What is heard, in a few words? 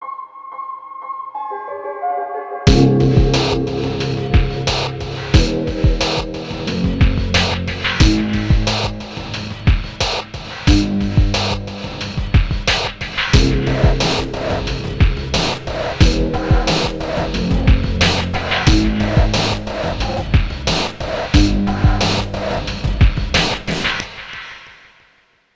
drums